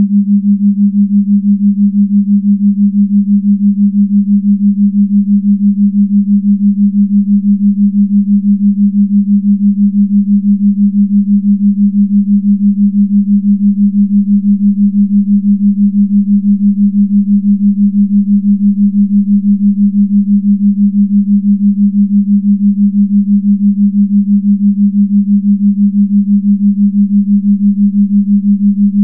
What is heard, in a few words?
theta 6hz; deep meditation